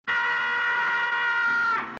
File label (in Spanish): grito